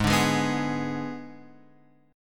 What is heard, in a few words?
G sharp Diminished G sharp, Guitar Open F; G#dim strummed; JGuitar